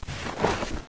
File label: go from heal